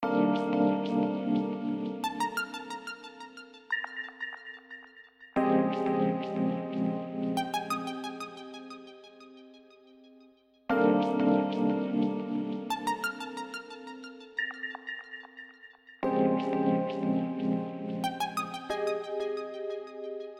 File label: Tension